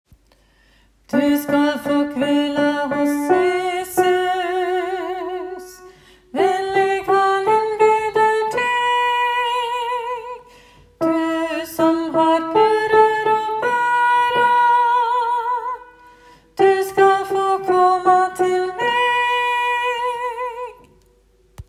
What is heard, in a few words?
bass; du skal fa kvila hos jesus; åfjord